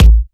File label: Electro Kicks